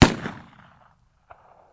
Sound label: smg1